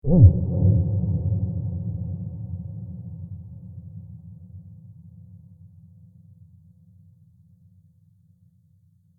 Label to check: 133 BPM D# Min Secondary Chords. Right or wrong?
Wrong